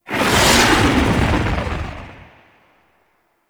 Blue Sky Liset Fly By Quick, vehicles; Warframe; Liset Flight

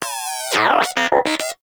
Moa Inquisitive Vocal Greeting; Pets; Warframe

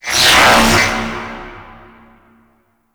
Grineer Pursuit Space Ship Cloak Ability; Abilities

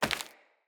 Grunt Step Default, footsteps; Enemy; Characters; Corrupted Sproutfolk

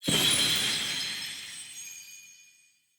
Lore Collected Spark Burst

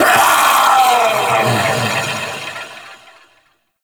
Vocal Death; Warframe; Duviri; Dax

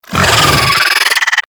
Pet Vocal Angry; Warframe; Alad V Pet

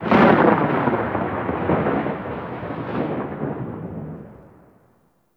Thunder One Off; Ambience; Warframe; Sacrifice; Vitruvian Memory

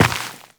Step Dirt, Footsteps, Walk; Warframe; Tenno

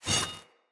Sword Whoosh Accent; Weapons; Swords; Fenn Jotar Sword

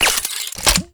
Rocket Launcher Reload; UT Weapons

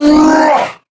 Attack Roar; Warframe; Sargas Ruk Boss

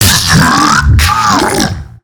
Hand Bomber Death; Warframe; MiTW; Battle Barks

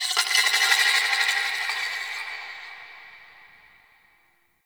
Sentient Texture Small, Ambience; Sentient Lair

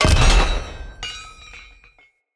bird repulsor destroy; Objects; Thawtide

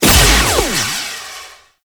Hazard Cast; Volt Prime Boss; Warframes

